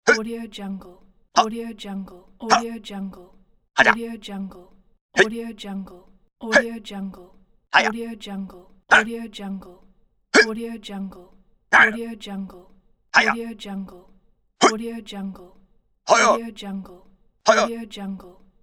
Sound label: kung fu short shouts; threestatefx